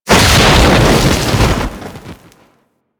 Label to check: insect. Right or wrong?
Wrong